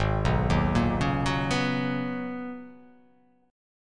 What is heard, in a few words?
Ab Minor 11th, Ab; Abm11 arpeggiated; JGuitar